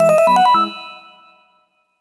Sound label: Success Jingle; Dragon Ball Z Budokai 3